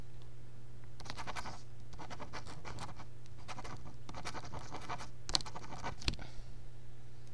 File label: Writing pen on paper